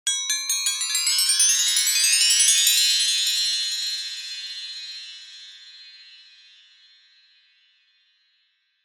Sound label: magic spell metallic ascending chimes